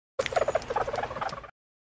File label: stoat